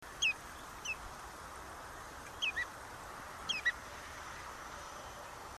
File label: common redshank tringa totanus